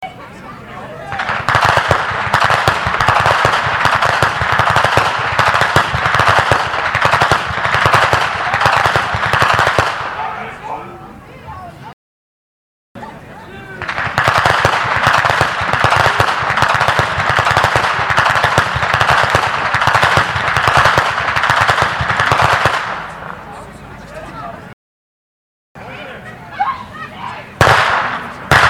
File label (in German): Schnalzen; Thundorf Strass; Ainringer